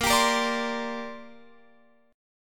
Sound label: A sharp 6th Add 9th chord, Guitar, Open F tuning; A#6add9; strummed; x,17,19,17,17,19